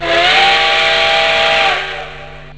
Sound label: Train Steam Whistle